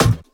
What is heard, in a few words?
Toms